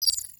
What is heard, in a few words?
modal Opened